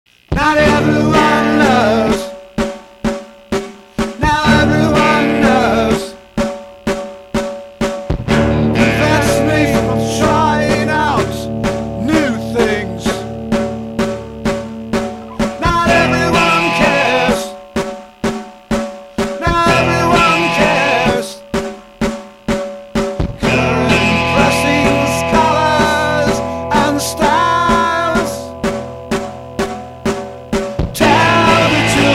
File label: guitar